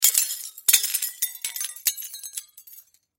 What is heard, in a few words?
Crash Glass Debris Impact; Crash Glass Debris Drop; Materials; Blastwave